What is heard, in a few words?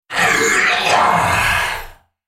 Droid spellcast effort